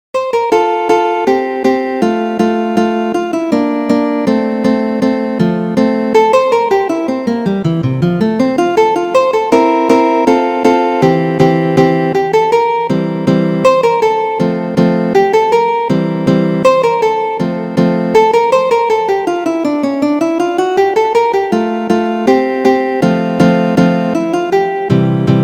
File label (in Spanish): guitarra andante; Ferdinando Carulli